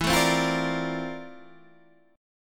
F Major 13th E, guitar chord

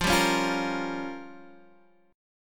G Minor 7th Flat 5th

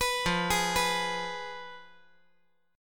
F Diminished

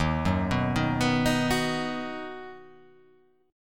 D sharp Minor Sharp 5th D sharp

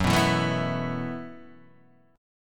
B 7th Suspended 4th F sharp

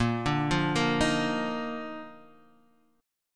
Eb Major 7th Suspended 2nd Bb

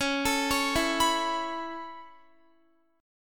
C sharp Minor 7th Sharp 5th C sharp Guitar; C#m7#5 arpeggiated

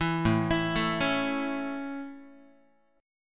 A Major, Guitar; arpeggiated

